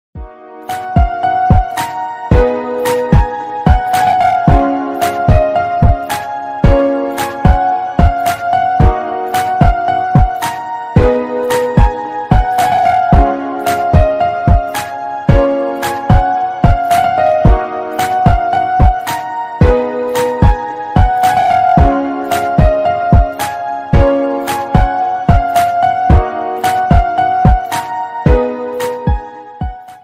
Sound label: bgm tone instrumental ringtone